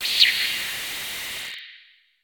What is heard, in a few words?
Blip Swoosh